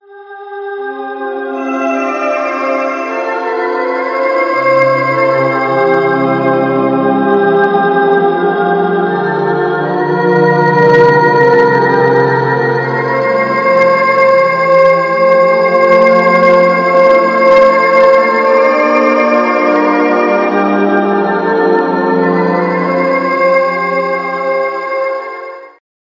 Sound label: Cosmic Odyssey Voice; Magnus Choir